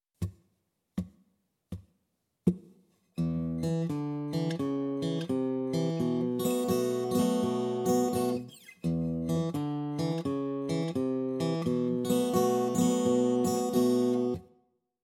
Open Tunings For Blues Guitar; An Easy Guide To Open Tunings For Blues Guitar

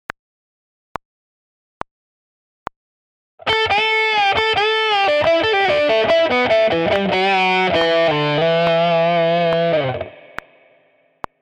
Advanced Bending Part 2

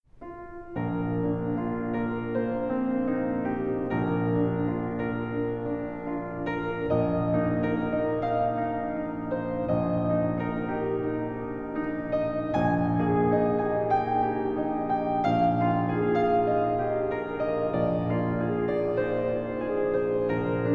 Grazioso

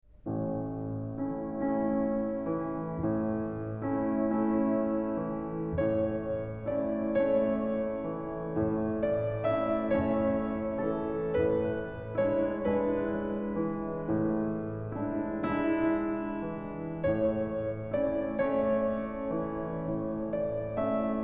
Grazioso

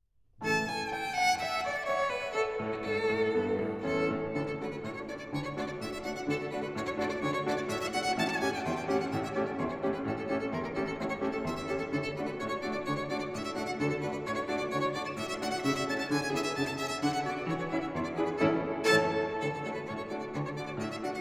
Galop

